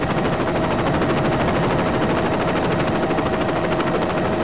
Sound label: chopper